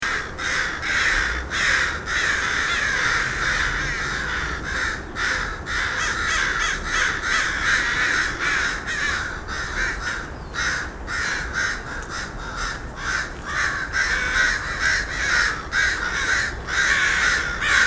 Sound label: crows mob x3